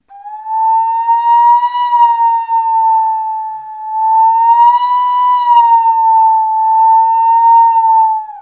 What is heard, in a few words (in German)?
sirene